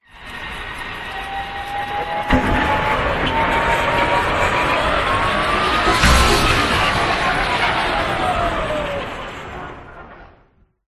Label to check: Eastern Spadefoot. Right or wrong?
Wrong